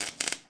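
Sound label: ambience interior arc; Tony Hawk's Project 8; XBOX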